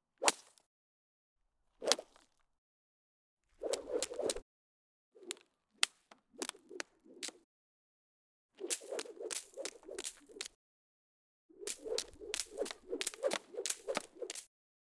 Whipping

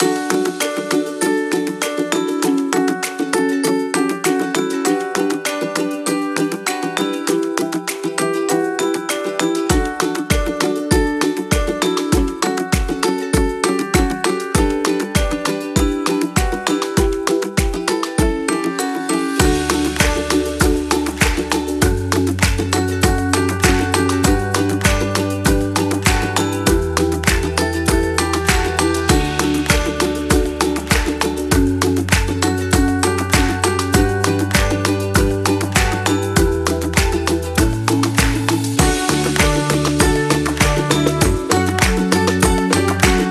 Happiness Bpm 99 Loop

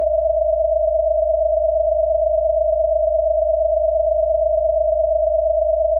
Spaceship

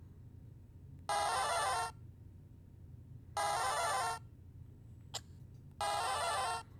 Game Boy Monster Sound

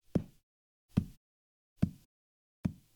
Generic Soft Wood Floor Hits

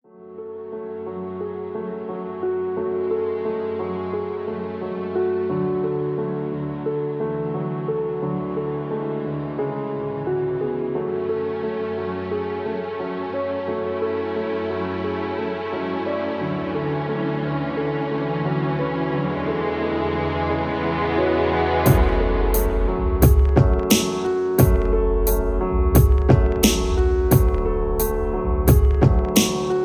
Beat Sad Hope

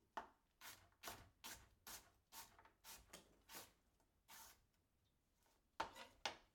Kitchen Chopping Onion

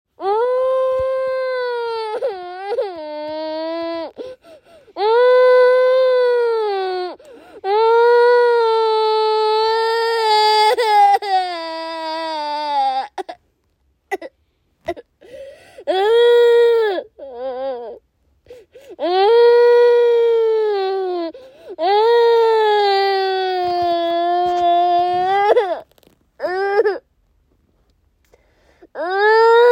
Female Child Crying

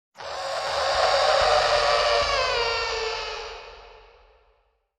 Atmosphere Distant Screaming

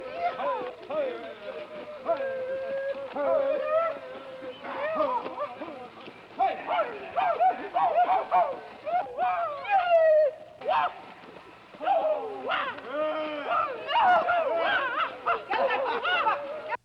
Yee Haws And Whooping

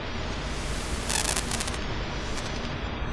Alien Electricity Reverse

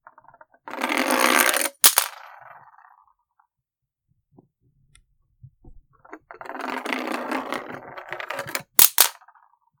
Pencil Rolling Of Desk And Landing

